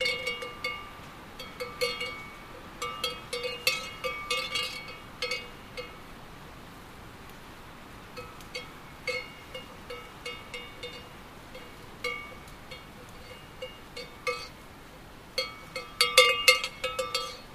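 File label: Cow Cowbell Movement Wind; cows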